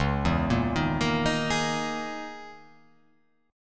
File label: Db Minor 6th Add 9th Db down; Dbm6add9 arpeggiated; JGuitar